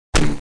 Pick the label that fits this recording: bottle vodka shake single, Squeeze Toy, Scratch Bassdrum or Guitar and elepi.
Squeeze Toy